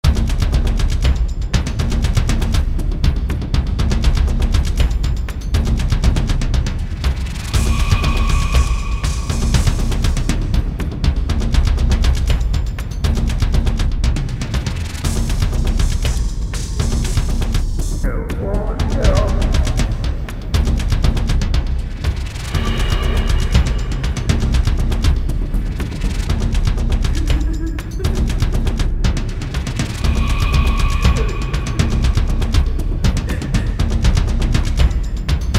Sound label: Spectral Ambience Battle; Legacy of Kain Defiance